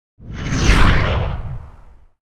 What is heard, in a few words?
Epic Swishes; SwooshSounds-2